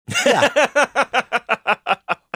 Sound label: laughing says yeah